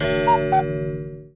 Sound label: cuckoo clock